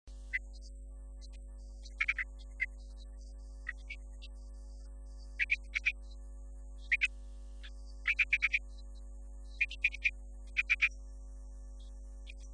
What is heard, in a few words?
Marsh Frog; wildlife on the romney marsh